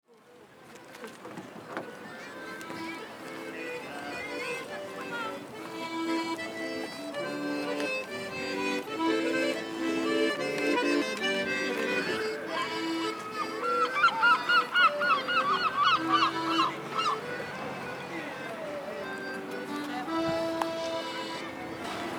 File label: Accordeon player; lesconil2025